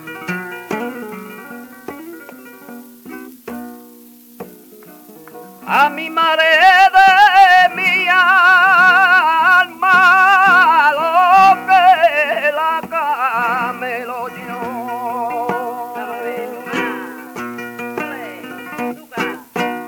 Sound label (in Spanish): Solea, flamenco; del Mellizo